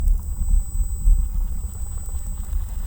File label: Hydrophone